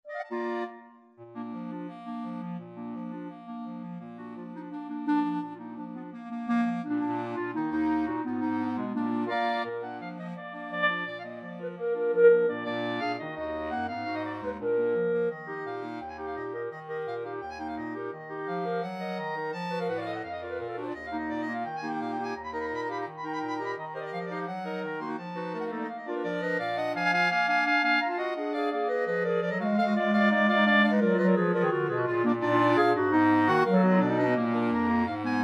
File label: klezmer